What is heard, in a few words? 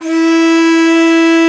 DIZI, Winds